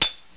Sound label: chink; duke nukem total meltdown